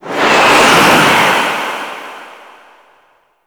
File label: Sandstorm Gust Enemy Pickup; Warframe; Mummy